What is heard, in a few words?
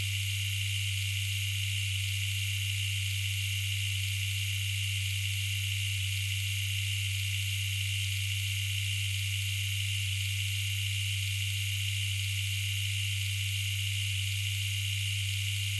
machine; Monster girl quest